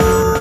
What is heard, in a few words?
Synth Shot D; Mutekki Media; Vengeance Electroshock Vol.2